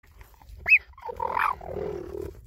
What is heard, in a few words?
Cheetah Chirp Growl